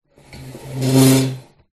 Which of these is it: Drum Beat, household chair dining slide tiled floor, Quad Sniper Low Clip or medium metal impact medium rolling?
household chair dining slide tiled floor